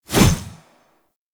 Hover Whizzby; Enemies; Deco Hover Whizzby Envy; Warframe; Warframes; Pax Duviricus

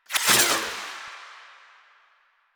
Finisher Parazon Unequip Short; Kuva Lich; Warframe; Finishers

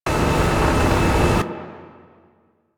Blast Door Loop; Base; Star Base

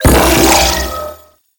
Riot Moa Shield Activate; Warframe; Attacks

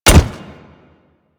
Pet Step Default; Warframe; Alad V Pet

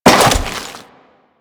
Crewman Step Goo, Footsteps; Warframe; Corpus

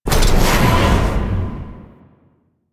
Void Dragon Firebreath Mech; Warframe; Duviri; DuviriVoidDragon; Weapons

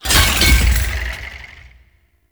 Melee Death Fall; Warframe; Necramech

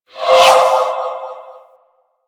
Spirit Primary Fire Whizzby F; Warframe; Grineer Thumper Bot; Sentient Spirits

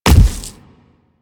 Splitter Step Default, Footsteps; Warframe; Infested; Grey Strain

